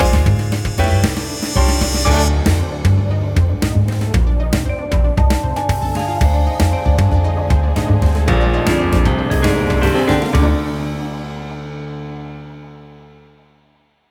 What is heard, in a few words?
Intruder Confirmed; gun point